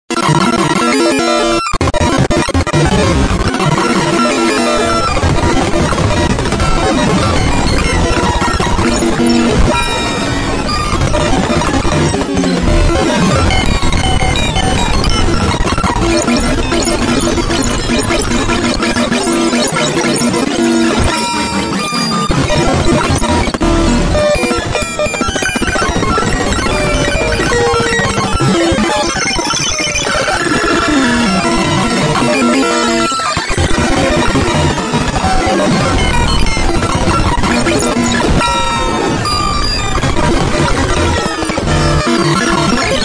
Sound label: crazy machine junk; Electro and Synthetic